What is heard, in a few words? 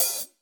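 hi-hat, Drums; Bob Clearmountain Drums I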